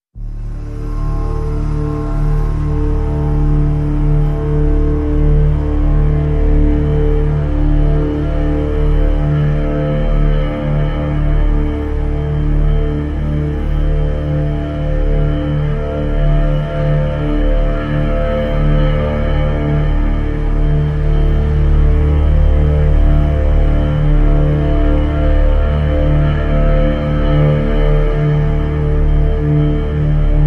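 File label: Drn D, drone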